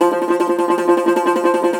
cumbus saz F, plucked strings; E-MU Producer Series Vol. 7 – Old World Instruments

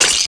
RUB, METL IMPA; Total Control - Action Cutz

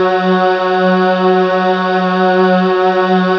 vox F#2, voices

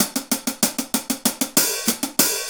Hi Hat Pattern

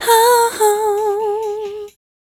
FEMALE, Dance Vocals

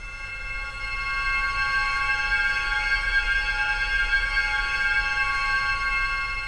ATMO; Optical Media International; Sonic Images Library; SI FX Vol 19

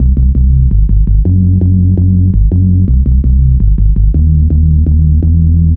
Menace Throb Bass, Bass Lines 166.5; Jungle; Jungle Warfare 1&2

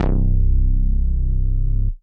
Synth Prophet Bass C; Electronisounds

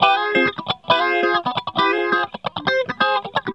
GUITARS 135, House

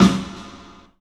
PA DEEP R, CLUB SN VRB; 3D Sound; 3D Drums

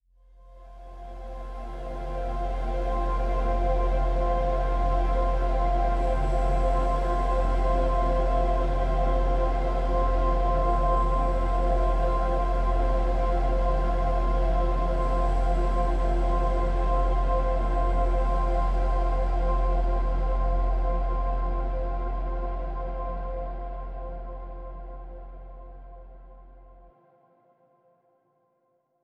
Ambience F Maj; pad

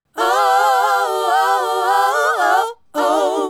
Female Group, Hip-Hop and RnB Vocals

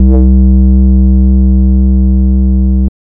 Sine This Rude C1, Synth Hits; Zero-G; Soundclash Nu Dance Electro; Acidized

